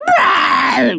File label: Angler Death